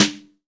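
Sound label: brush snare, GM drum set; AKAI S-Series CD-ROM Sound Library VOL-8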